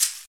natural shaker sound d key, shaker hi hat tuned to the D note